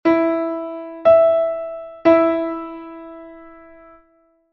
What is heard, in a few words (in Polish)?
oktawa e1 e2; konsonans i dysonans